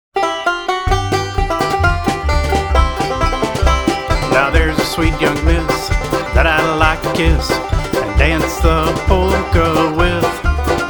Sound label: Polka on the Banjo